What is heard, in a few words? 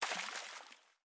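Splash Small; Boomerang Fu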